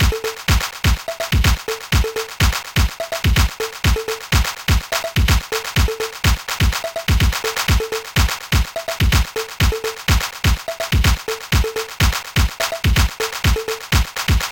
acid beat; Trackers Modland

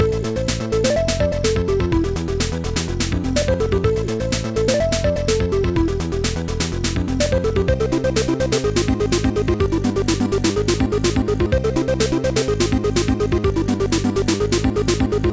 xylophonatic chip, Trackers; Modland

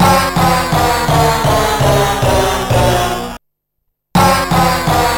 latrine jingle, Trackers; Modland; Rogue Male

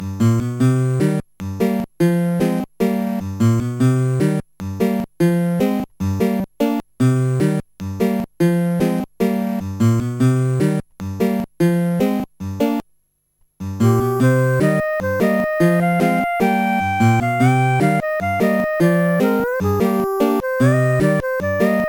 guitar plink

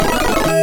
jingles; superscramble

